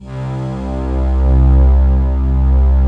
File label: FINAL PAD; ENDINGPAD